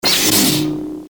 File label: lightsaber; star wars